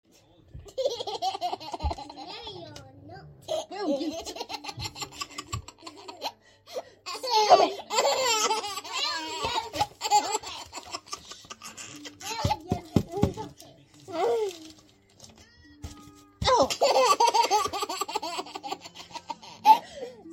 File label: laugh ringtone